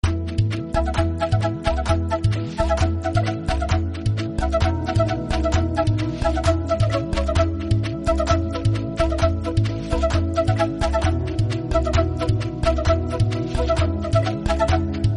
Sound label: Contra bass flute music